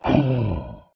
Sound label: roar, creature